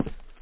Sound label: footstep; fear